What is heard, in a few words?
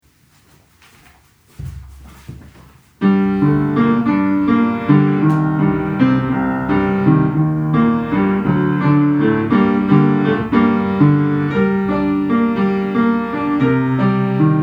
Samba Playback